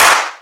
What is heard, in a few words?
Slap